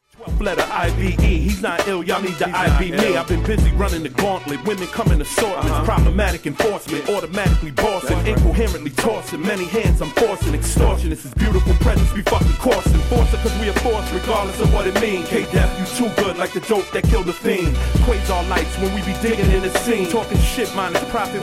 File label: chatter